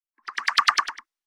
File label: Heli wash